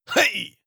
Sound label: effort grunt male